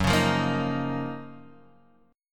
Gb Major 13th, down, Guitar, Drop D

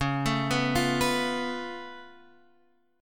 Db Augmented 7th Db down Guitar Half Step Down

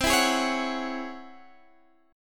Db Minor Major 11th C

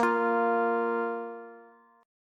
A sharp 5th A sharp, Bass; down

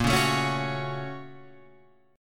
Bb Minor Major 7th Sharp 5th, Guitar, Open F; BbmM7#5; strummed; 10,8,9,8,9,8; JGuitar

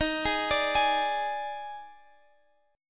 D sharp Major 7th Suspended 4th; D#M7sus4; arpeggiated; x,x,13,13,15,16; chord shape

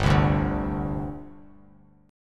G Suspended 4th, Bass Standard; Gsus4 strummed; JGuitar

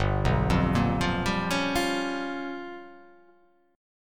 G 7th Sharp 9th Flat 5th

